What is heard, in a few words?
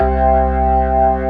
B3 C 3-3, ORGAN